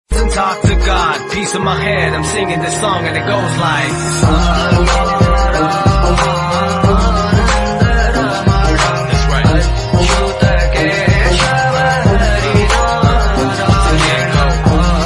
Female Ringtone

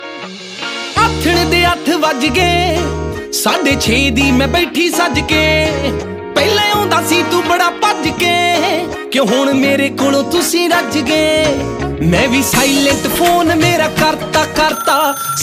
Punjabi Song Ringtone

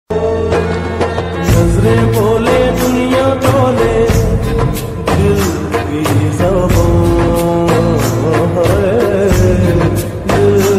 Love Ringtone

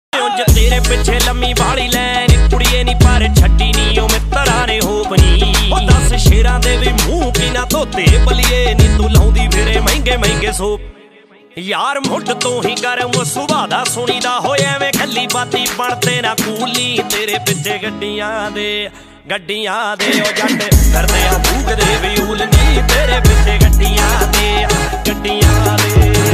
Punjabi Song Ringtone

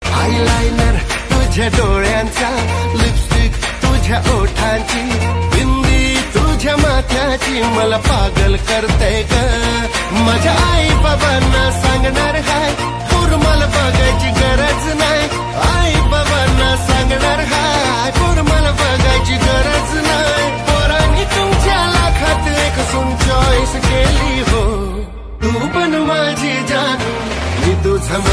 Marathi Ringtone

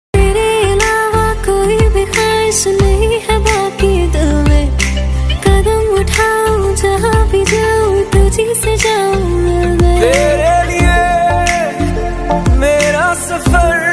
Ringtone

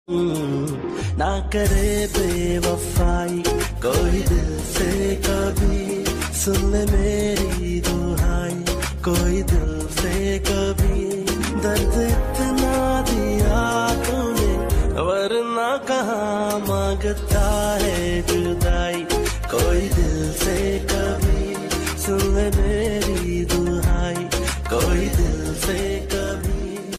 Sad Ringtone

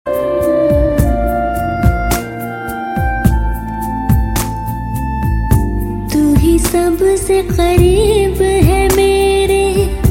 Very Painfull Hindi Mobile Ringtone; ringtones

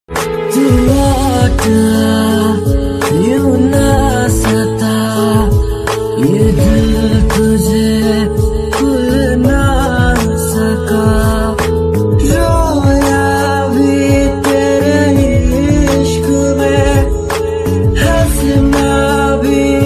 Sad Ringtone

